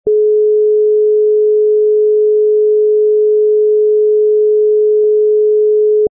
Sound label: Dialtone Lite; Motorola; M1000